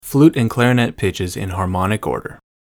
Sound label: fl harmonic, flute; flute clarinet